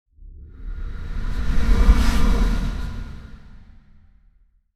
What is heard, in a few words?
Spaceship pass by fast